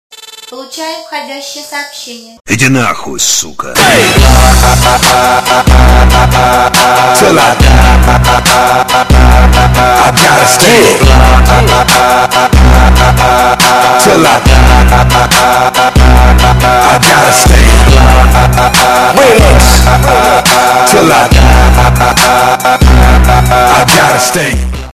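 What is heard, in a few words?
incoming message ringtone; poluchayu vhodyaschee soobschenie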